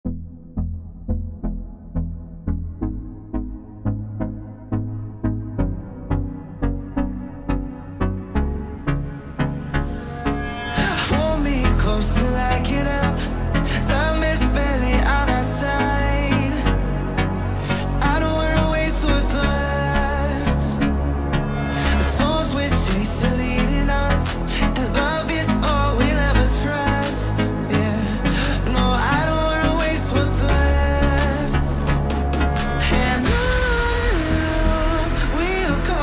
hardstyle remix; gym hardstyle

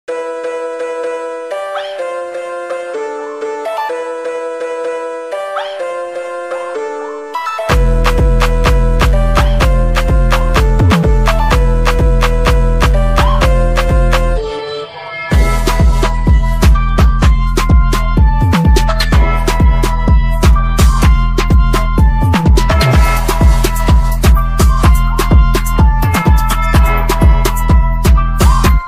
instrumental

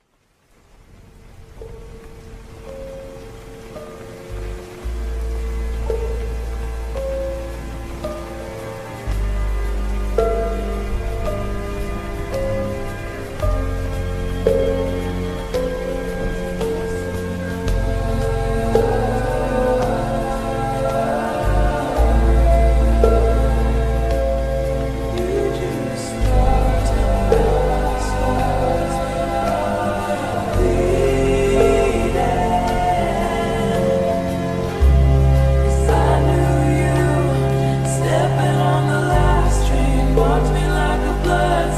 slowed reverb

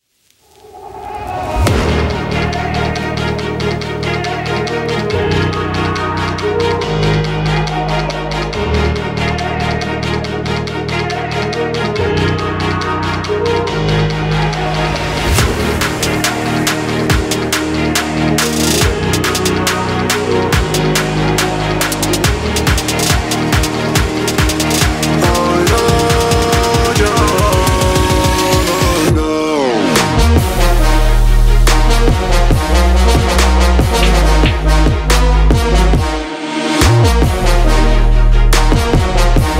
trap remix

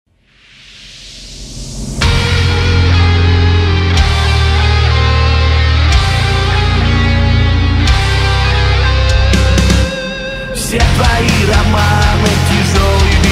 metal cover рок версия

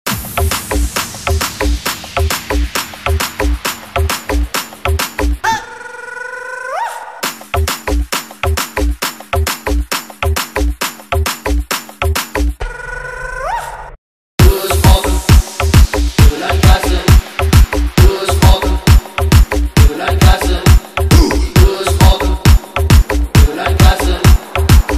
phonk remix

